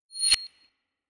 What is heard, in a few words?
swish suck reversed metallic swoosh impact